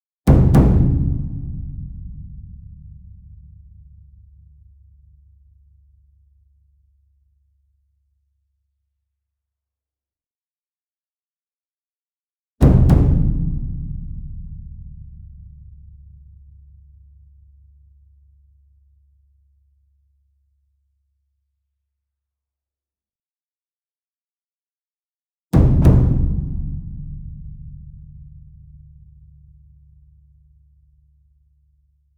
ENSEMBLE DRUM Bass Drum Concert And Taiko Double Medium; boom library; Cinematic Strikes Construction Kit